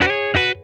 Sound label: E9 SLIDE, Funk Guitar; Zero G - Funk Guitar